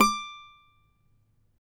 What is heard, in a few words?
CAVAQUINHO 6C; Guitars; Spectrasonics; Hans Zimmer Guitars VOL-2